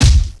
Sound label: CAN LAND; Screen Wreckers